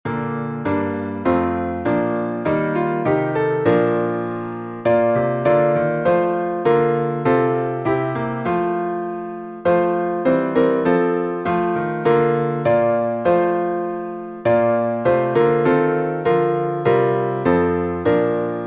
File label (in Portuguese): salmo instrumental